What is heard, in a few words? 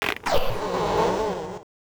Bow Draw